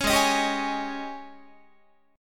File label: G sharp Major 7th Suspended 4th, Guitar